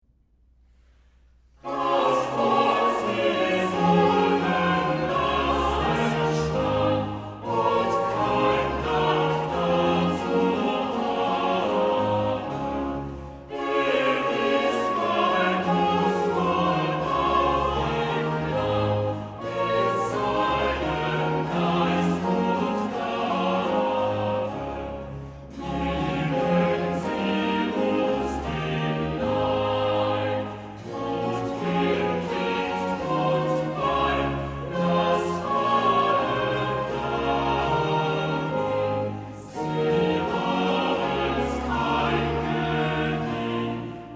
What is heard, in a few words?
BWV 80 Choral